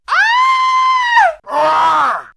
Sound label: Fear Screams; vox mix; X-Static Goldmine